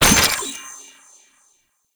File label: Courier Trinket Collected; Warframe; Duviri; Courier Delivery